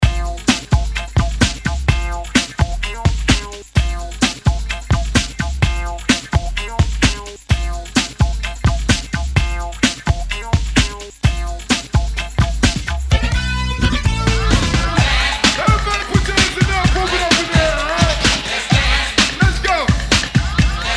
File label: 70s Hype, 128 Bpm